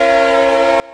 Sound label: Leslie RS3L Short, Transport Trains Horns